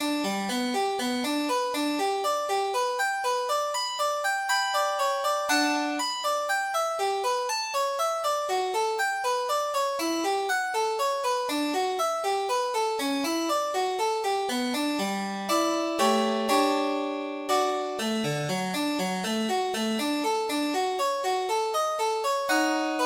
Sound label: Partita, piano